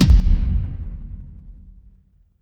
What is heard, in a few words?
transition impact hit